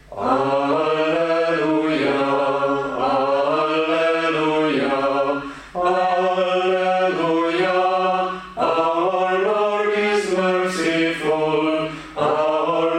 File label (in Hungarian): Alleluja ósi szír angolul